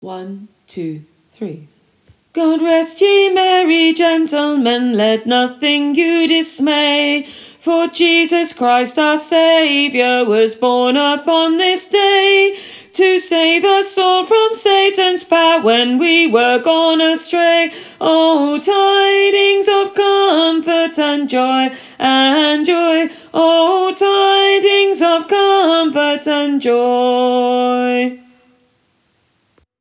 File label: Alto